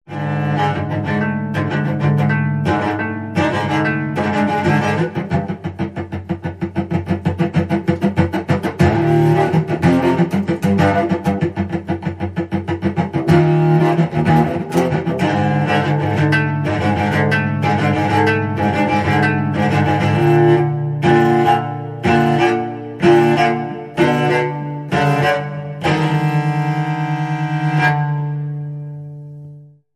Cello Danger Eerie

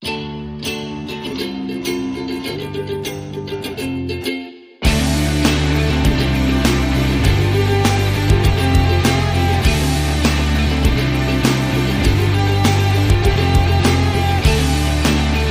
Ukulele Indie Groove

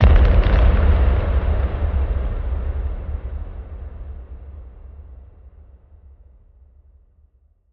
Crumbling Boom

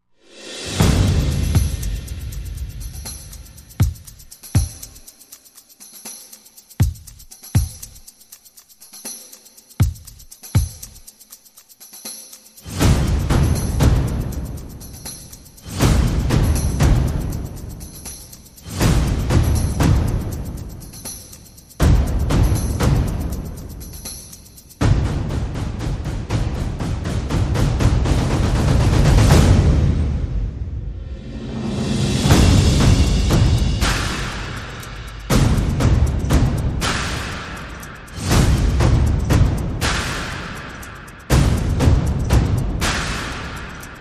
Perc and FX

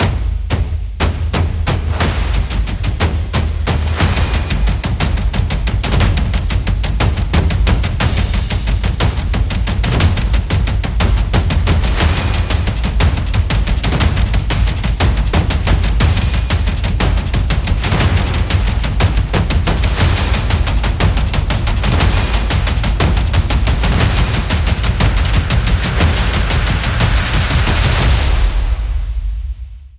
Enemy Tribe, Percussion

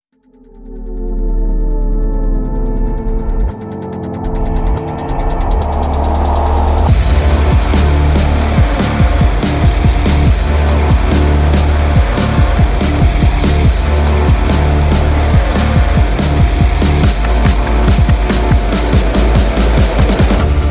No Synth Lead

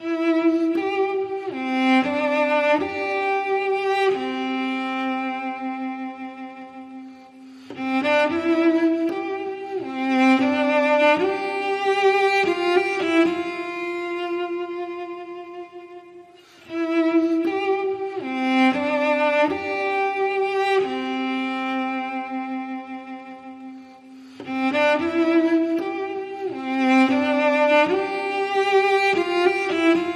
Cello Solo; 30sec

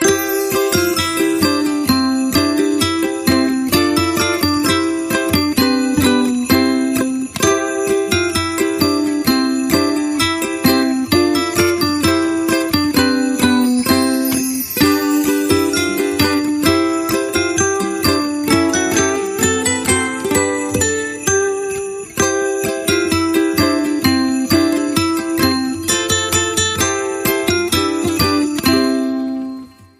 Deck The Halls Ukulele Bells

